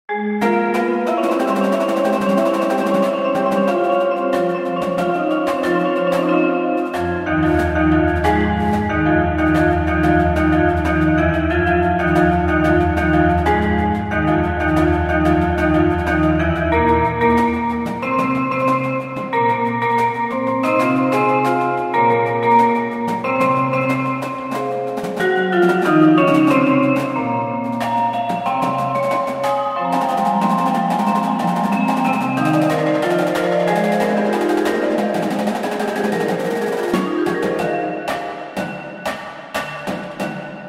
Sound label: Percussion